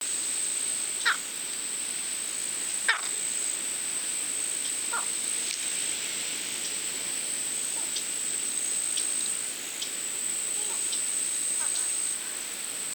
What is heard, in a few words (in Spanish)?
garcita cangrejera; cantos